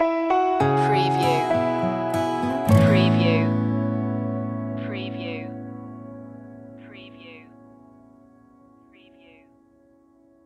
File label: heart to heart stinger